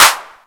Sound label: drum hitclap; osu